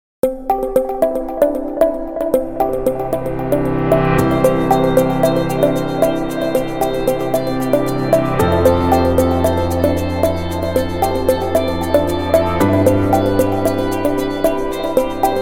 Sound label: In Corporate; corporate music vol 6